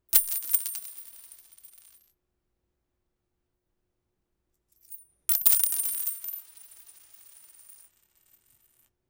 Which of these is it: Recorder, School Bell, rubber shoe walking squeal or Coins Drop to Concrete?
Coins Drop to Concrete